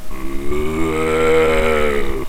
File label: growl; shamblie; npc